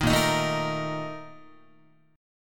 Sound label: A sharp Major 7th Suspended 4th, Guitar; strummed